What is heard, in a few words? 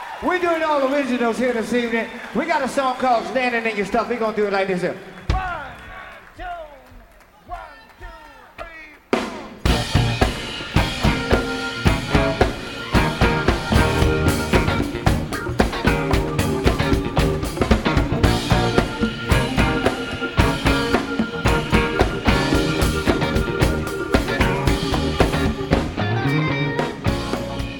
funk